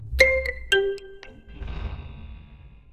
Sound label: cartoon fail